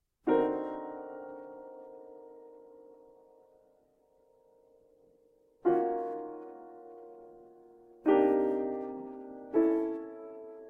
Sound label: Jazz Sequence; Albumleaf 39: October 13, 2010 (Farmville)